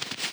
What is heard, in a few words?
crawling half rise; flukeman; Hollow Knight